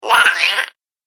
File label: Night Gremlin, Monster; horror